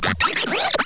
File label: Backpack chickenleg pickup; gonner linux macos ps4 switch windows xbox one gamerip 2016